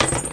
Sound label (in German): found sound mülleimer; UNUSUAL KITS and FX; Werkstatt Found Sound Kit